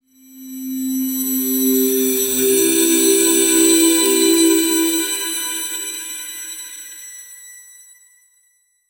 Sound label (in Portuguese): crie um efeito sonoro cur, ascending